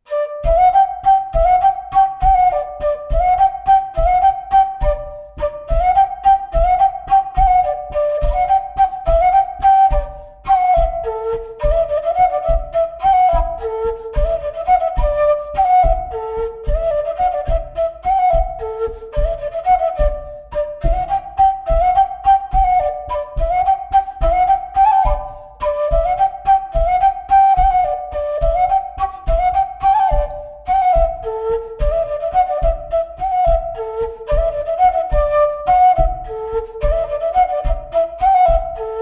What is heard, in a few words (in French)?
Montagnarde; Recueil De Raoulx